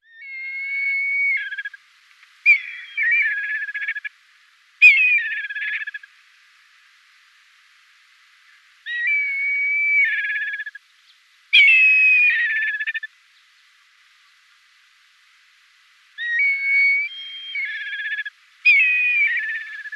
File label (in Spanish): milano negro